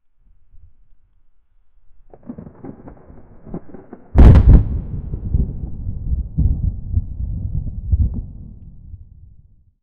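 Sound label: crackling fire distant thunder, weather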